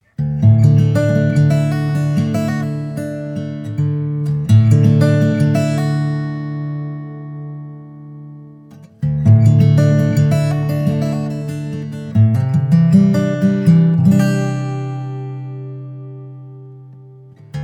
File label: Guitar